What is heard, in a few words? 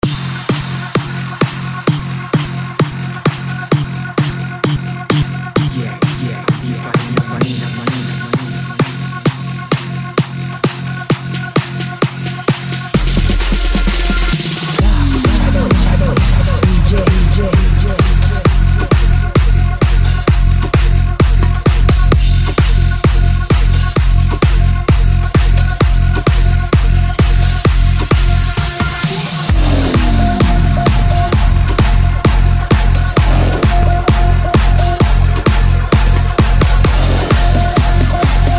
130 Bpm A Pretty House Future Bounce Trance; Dj Remixes